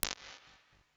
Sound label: Room, Chambers and Large Rooms; Ableton; Convolution Reverb